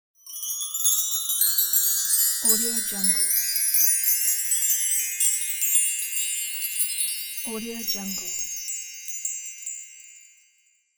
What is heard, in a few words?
chimes; volkovsound